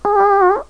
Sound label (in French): timide